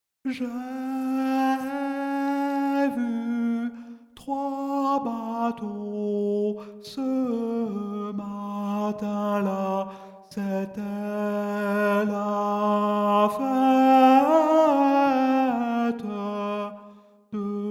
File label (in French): noel tenor